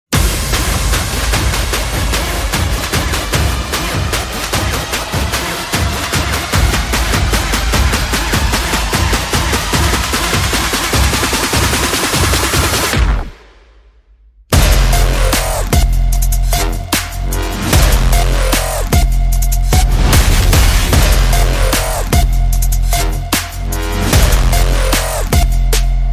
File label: No Lead Vocals